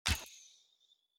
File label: bow shoot; Out of Reach: Treasure Royale